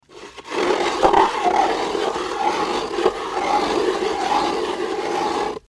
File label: Ice Scrape Circular